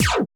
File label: ZAPPY PRC; TEK PERC3